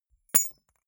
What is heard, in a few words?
key ring small drop carpet